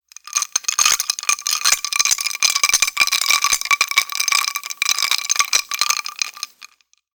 food drink stirring ice cubes in glass; sound effects one

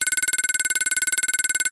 science fiction spaceship alarm

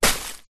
footstep single long grass; foley; zapsplat

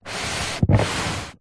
cardboard box shoe box slide carpet short

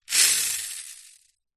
leisure toy plane pull back motor release whoosh

